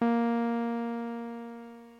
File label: bbm, Omnichord